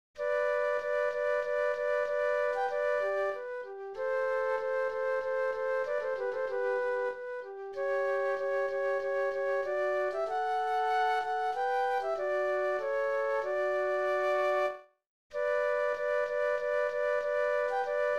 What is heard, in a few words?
Minuet Flute Duet; classical; Mozart; K. 527